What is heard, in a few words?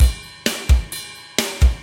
metal